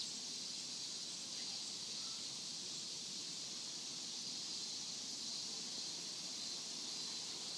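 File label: pagoda crickets; ambience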